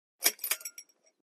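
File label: Chain Pull Light Switch; switches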